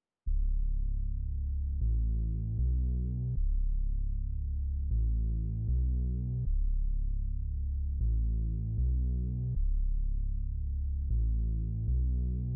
155 BPM C# Min Bass; Melody; Loop Stems; Cymatics; Infinity Melody Collection (Preview)

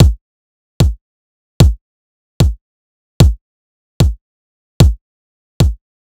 Dubstep Drum Loop Kick, 150bpm; Full Drum Loops (Stem Seperated); Ghosthack; Dubstep Sound Arsenal

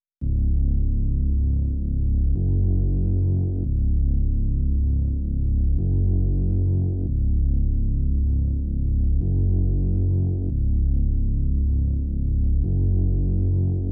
140 BPM C# Min Bass, melody loop stem; Loop Stems; Cymatics; 2022 Melody Collection; Wavy; Drift Away

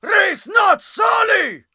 Battle Cry, Viking Vocal, Un-Processed; Viking Vocal Builder; Battle Cries & Phrases

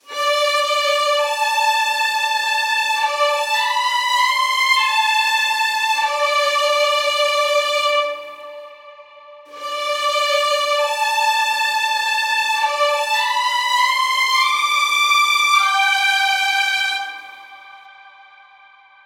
Full Strings Section Melody - Amin 100BPM; Construction Kits; STEMS; Ghosthack; SkyRealm; Kit 10 - Windhelm Forest

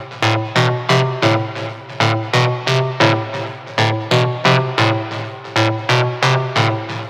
Synth Loop D 135, Techno; Ghosthack; AC2024 - Day 1 - Techno Sounds 2025

